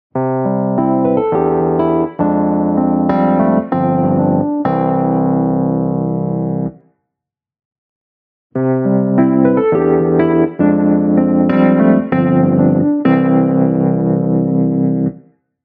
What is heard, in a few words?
wurlitzer